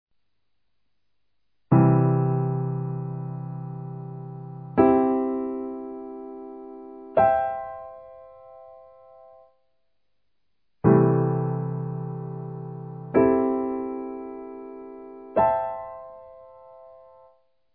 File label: Maj 6th